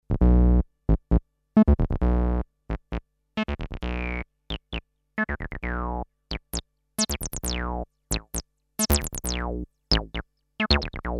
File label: Behringer TD-3 Pattern